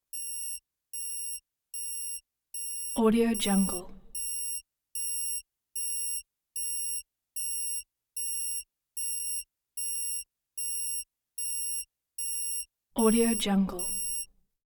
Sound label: beeps alarm squelch repeating constant; prosoundfx